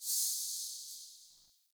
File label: Under City Alley Distant Chains